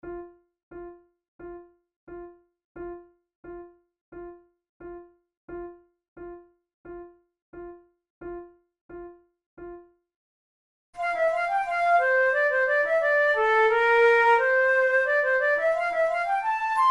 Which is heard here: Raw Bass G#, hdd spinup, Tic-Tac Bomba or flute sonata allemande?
flute sonata allemande